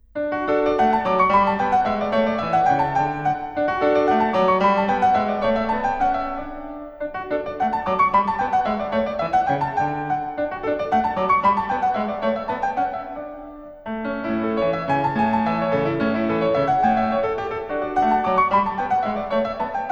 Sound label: german dance; beethoven ludwig van